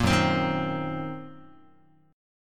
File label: Gb 6th Flat 5th Gb down Guitar; strummed; Gb6b5; 1,2,3,1,4,x; JGuitar